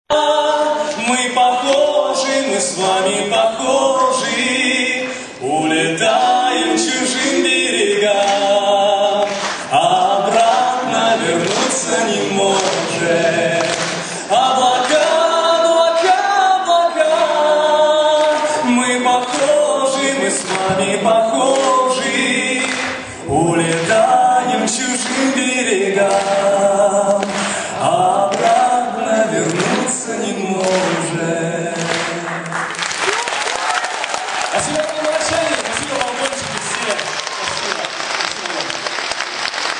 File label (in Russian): akapello